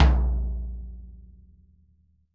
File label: Bombo Stick Drumhead open; Best Service; Festa Latina VOL-1; Single-Hits